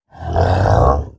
劫掠兽待机声

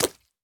青蛙长跳声

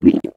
蜂蜜瓶饮用声

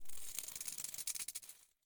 受伤传导声; 嘎枝之心

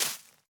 杜鹃树叶破坏声

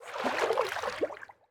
海豚游泳声

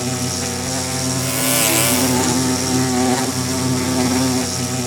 蜜蜂愤怒声

